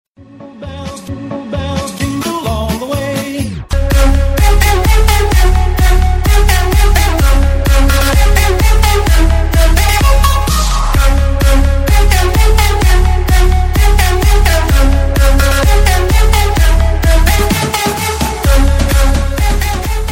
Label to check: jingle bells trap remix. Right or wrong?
Right